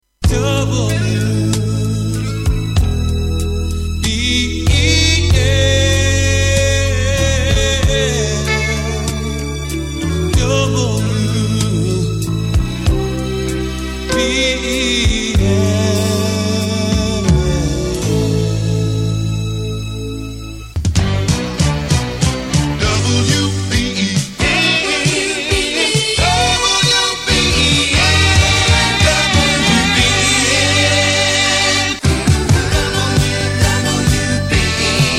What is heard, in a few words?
Jingle Montage; WBEN